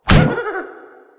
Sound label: Clicker game tap pumpkin